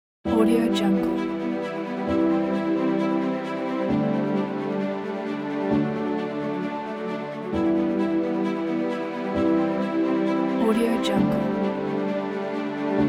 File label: epic motivational orchestral